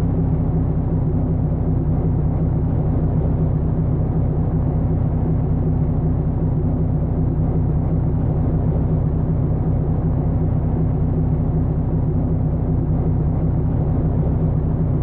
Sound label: idle, vehicles cars; lwcars